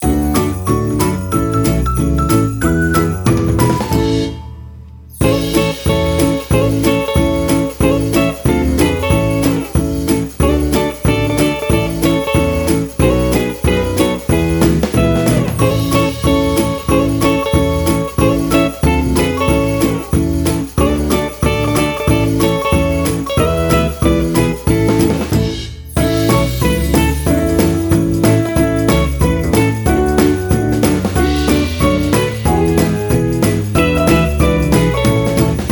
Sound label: Jingle bells happy